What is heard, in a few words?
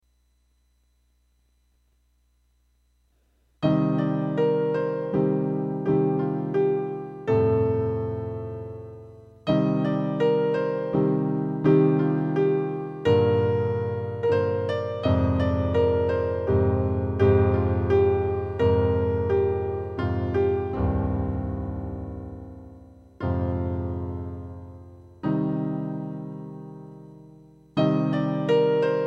piano; shenmue